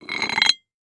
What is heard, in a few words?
Sports Weight Lock Unscrewing On Metal Weight Lifting Bar